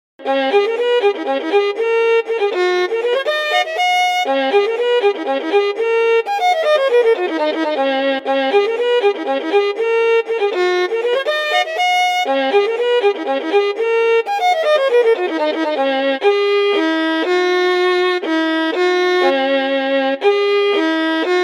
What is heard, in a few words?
fiddle melody